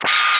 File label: drop part